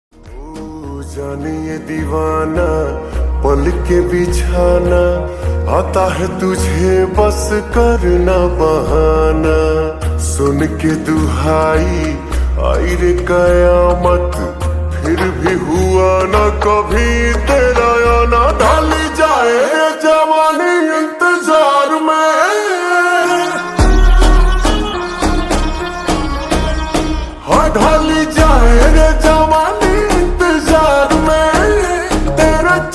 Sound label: Slowed Reverb Ringtone; Stree 2